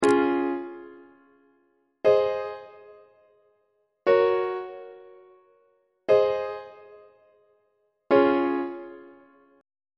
d flat major perfect authentic cadence; on treble clef

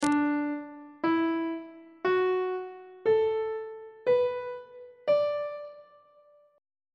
d major pentatonic scale; on treble clef

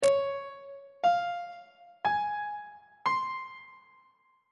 f phrygian 7th chord 6 is d flat major 7th; on treble clef

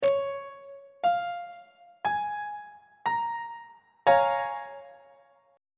b flat minor 7th 1st inversion on treble clef

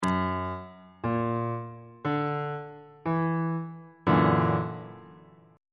f sharp augmented 7th chord

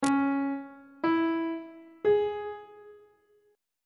c flat ionian chord 2 is d flat minor; on treble clef

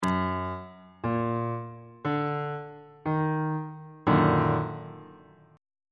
e flat minor major 7th 1st inversion

